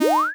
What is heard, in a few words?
Dash; unity